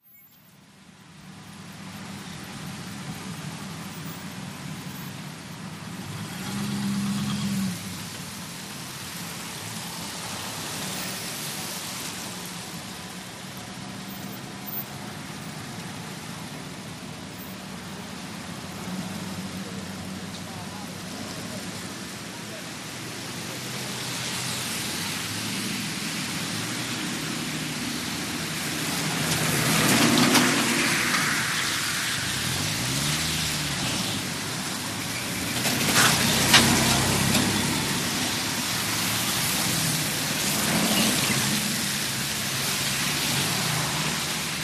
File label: Traffic Rain Siren, wet traffic